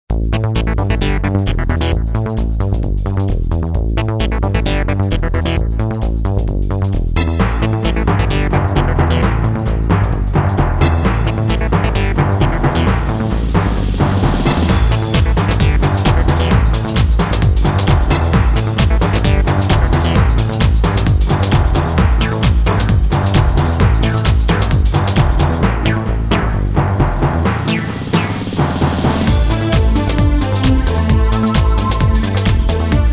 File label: Techno Club Remixes, Dance; Trance, Dance & Hard House